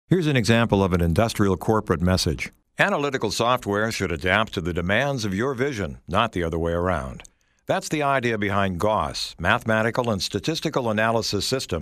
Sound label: industrial corporate